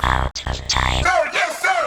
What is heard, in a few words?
House Loops, Vocal Loops House 128BPM